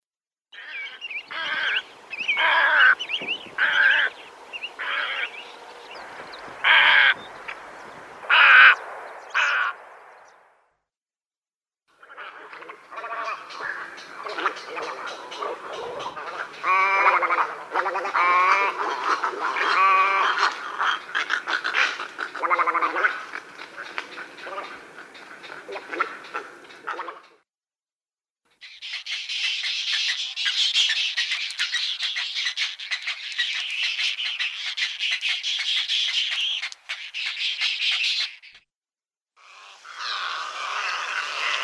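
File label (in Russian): Caplja malaja belaja, little egret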